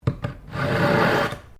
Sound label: KNIFE SHARPENER USE; Various knives; Kitchen (Sound Effects:Household)